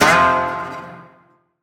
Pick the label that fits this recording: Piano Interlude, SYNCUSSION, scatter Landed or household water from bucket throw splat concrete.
scatter Landed